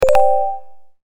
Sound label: leapster2 hint button